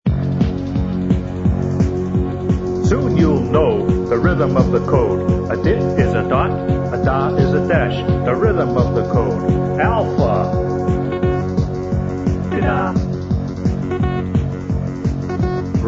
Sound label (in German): morselied; Morsemusik